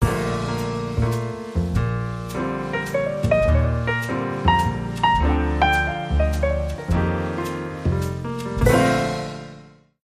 Twinkle Twinkle Little Star

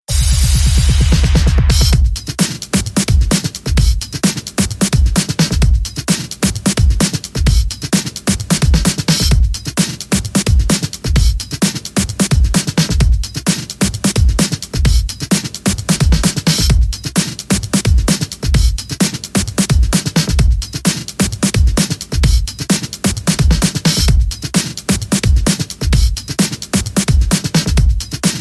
Anthem Beat

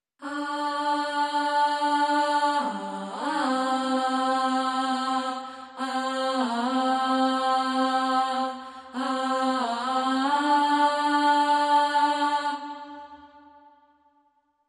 Choir

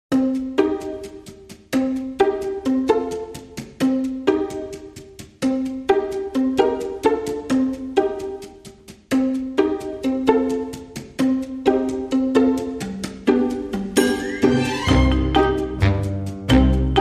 No Melody

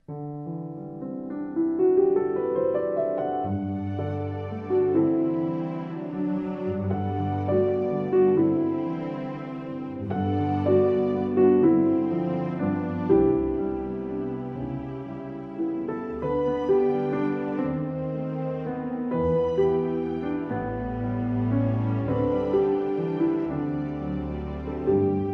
With Strings

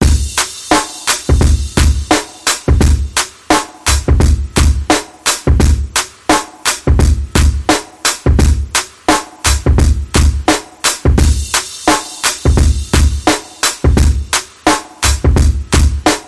Bananaz Beat

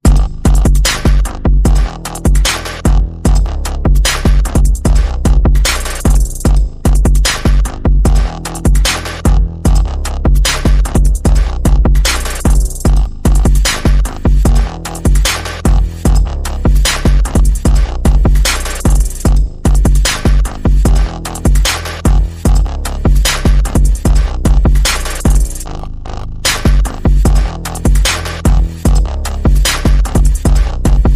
Bass and Perc

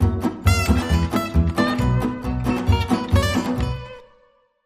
Fast Blues Swing

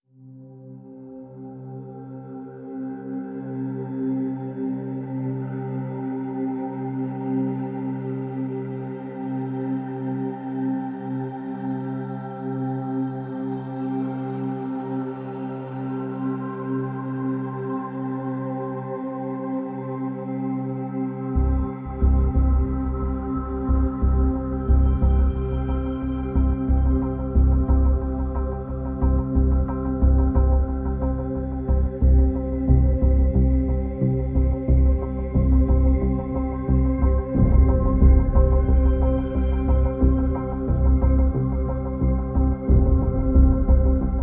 No Melody

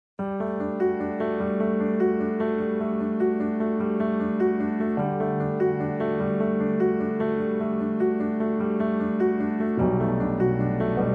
No Strings Reduced Arrangement

